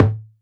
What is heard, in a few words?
Tom Low